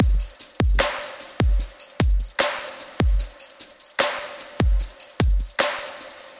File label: Drums